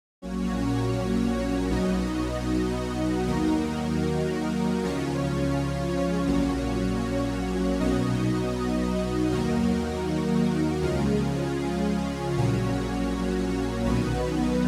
Bright Pad

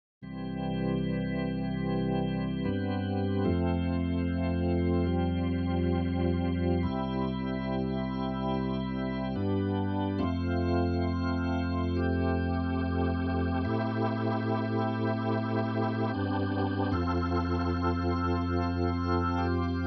Hammond Organ

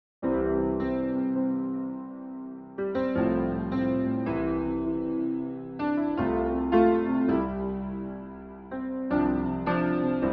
Chorus Piano

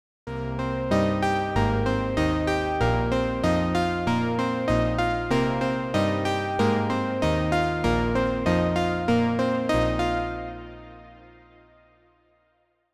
Elastic Synth

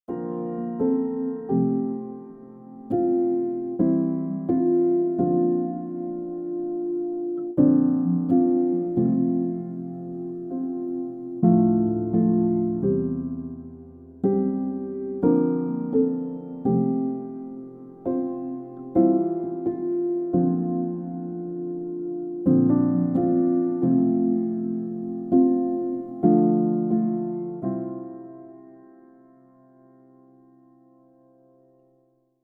Soft Piano

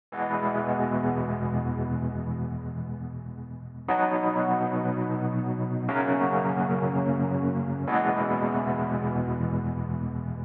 Delay Wurli

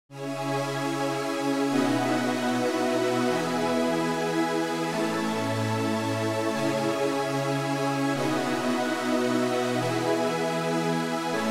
String Machine

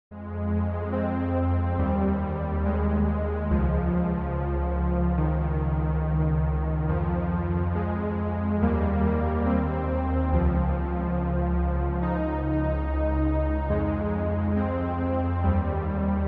Juno Pad

